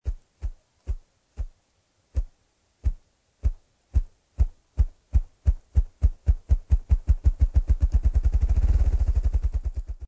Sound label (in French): tambourinage